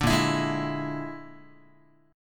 Bb 7th Flat 5th Bb, Guitar; Bb7b5; strummed; JGuitar

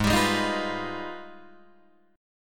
G sharp Minor Major 13th, Guitar DADGAD; G#mM13 strummed; 6,10,6,8,8,9

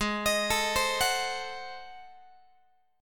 Ab 7th Flat 9th, Guitar; Ab7b9; x,16,18,19,16,17; arpeggiated; 4A,4E,5A,5D,5G#,6C#; chordshape; JGuitar

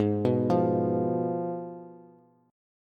Ab Minor 6th Ab, down, Bass; Abm6 arpeggiated; JGuitar

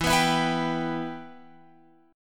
E-Minor, Guitar, Open F; strummed; JGuitar

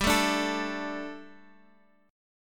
G Suspended 2nd G down Guitar Drop D

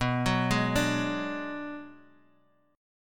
B-Minor 6th B down Guitar DADGAD

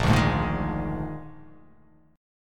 A sharp 11th

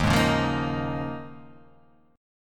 Eb 7th Suspended 2nd Suspended 4th Eb; strummed; chord

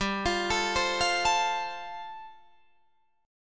G 9th Suspended 4th, Guitar Drop D

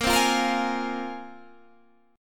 Bb 7th Suspended 2nd Bb

